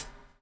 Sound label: BUBL; Spectrasonics - Backbeat